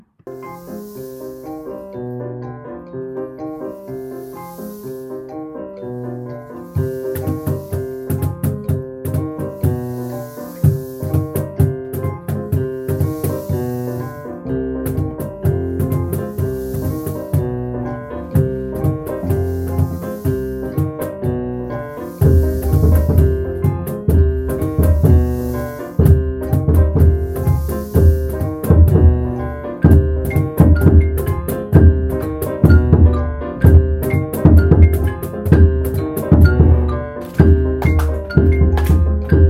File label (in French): Orchestre